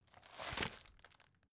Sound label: folder file jacket turn short